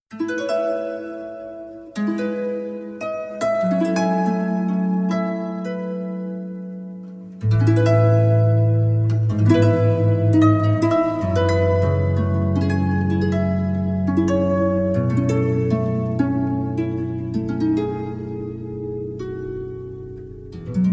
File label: harp